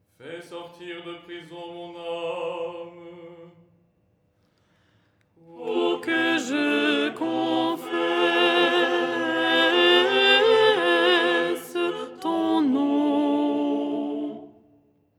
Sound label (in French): alto